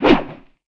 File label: Sword Attack swoosh; We Are The Dwarves; Fishmen